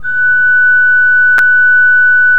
F5, Cathedral Organ, KOPPELFLUT; Propeller Island

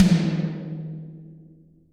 TOMS, Drums; Best Service; Real Mega Drums VOL-1

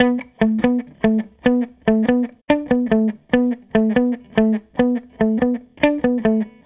SG Guitar Loop

